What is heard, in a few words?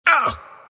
embr pain; dota; ember spirit